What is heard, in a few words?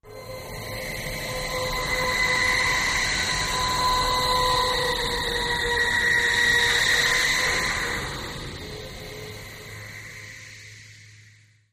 Magnet Welder Machine, metallic